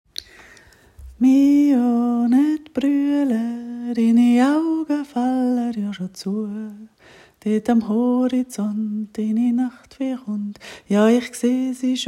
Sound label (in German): Mio nicht weinen schweizerdeutsch, kind